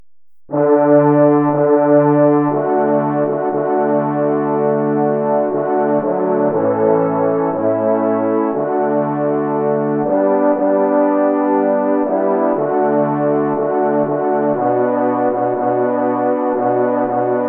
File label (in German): D Dur French Horn